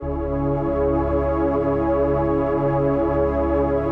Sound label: 122 BPM Pads Amaj Fmin Tonic C, ambient synth pads; MMB